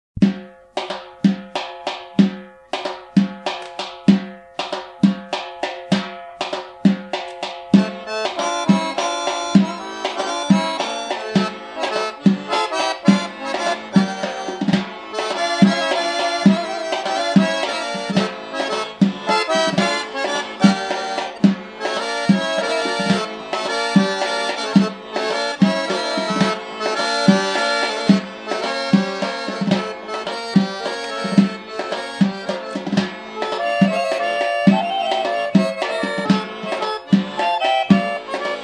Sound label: georgian folk dances samaia